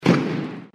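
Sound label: Fire Wrks, fireworks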